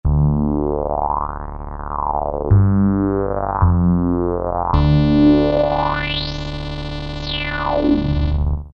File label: bas, E-mu Orbit V2; Orbiting